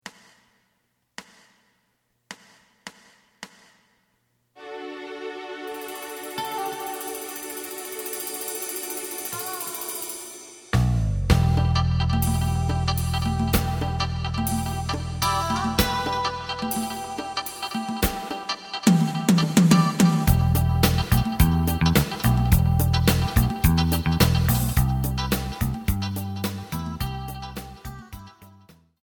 lead

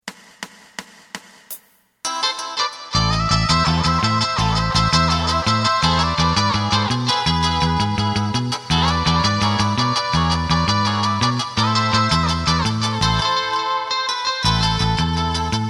drum; エレキバンド用カラオケ

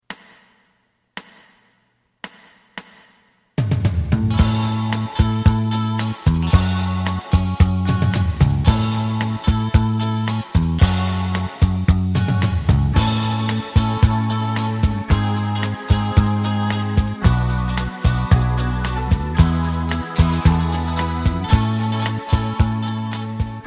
lead; エレキバンド用カラオケCD

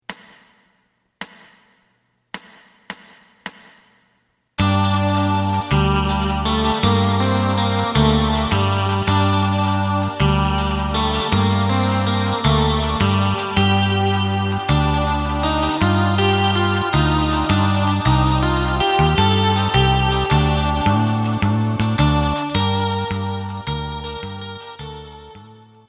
drum

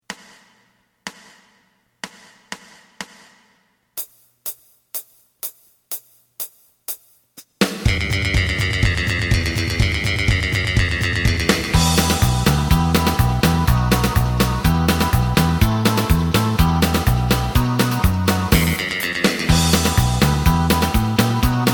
lead

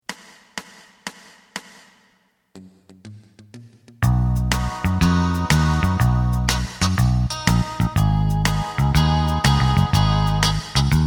lead; エレキバンド用カラオケ

